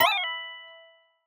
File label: UI, talking tones, bright percussion, playful, toonish, light ambience, select; Start Select